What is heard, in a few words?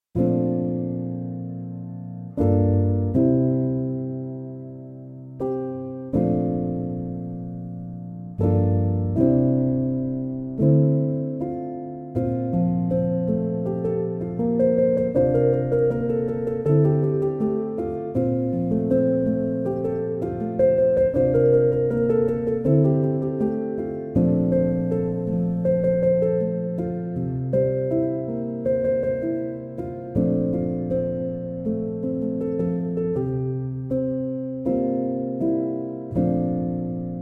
piano instrumental